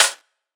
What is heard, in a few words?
Hat; M8; Electronisounds